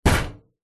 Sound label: impacts metal tin lid hit loose thud; zapsplat